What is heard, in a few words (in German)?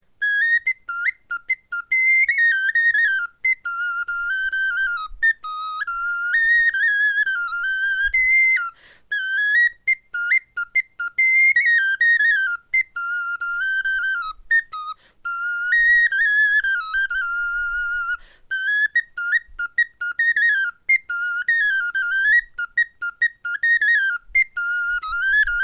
melodie, knochenfloeten